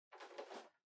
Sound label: Portable Stereo Far Pick Up